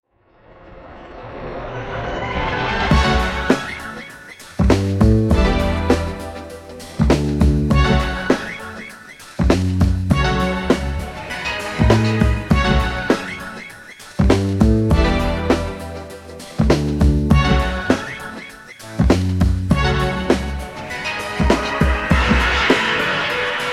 synthesizer arpeggio beautiful rhodes piano chords and melodies epic sweeping; string; percussion; mysterious; mystical; climactic; atmospheric